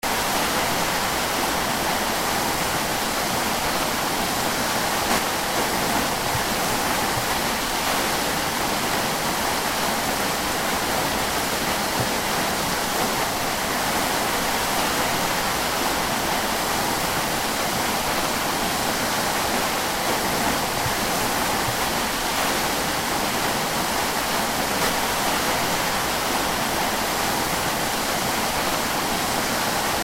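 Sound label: botanical garden fountain; germany potsdam botanischer garten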